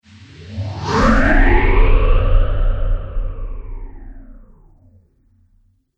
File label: Trailer Hit Dark Phaser Swoosh; Trailer Sound Effects